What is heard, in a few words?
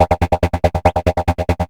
RAVER, LFO DANCE